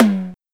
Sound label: Tom Hi; Linn Linn-9000; Drums